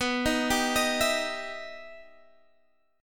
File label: E Minor Major 7th